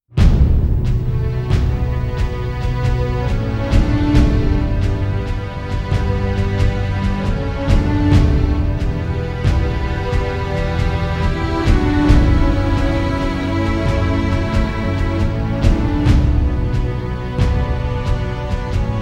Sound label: bgm, RockMetal